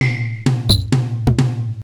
duck music; DuckShoot

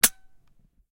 no ammo; CityHero

